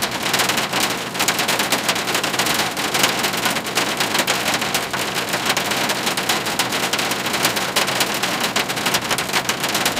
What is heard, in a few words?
rain pattering softly on a tin roof; calm